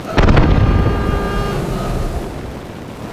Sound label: super heavy battleship damaged defend; Hearts of Iron IV Death or Dishonor